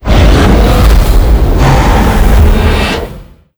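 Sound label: nix monster death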